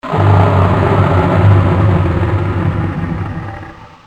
monster; Spookslot Tunes Effecten

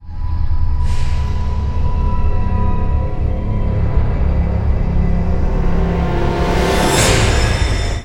Scarry effect; Spookslot Tunes Effecten

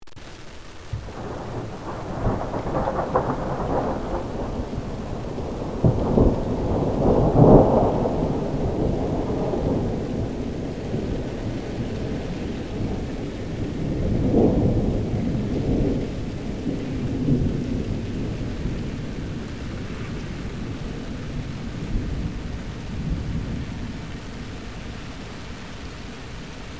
Thunder; Spookslot Tunes Effecten

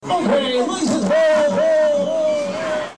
jingle; Kermis Kassa Diversen

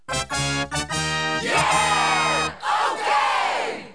Yeaaaah

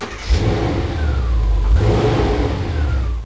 Auto; Kermis Jingles Diversen

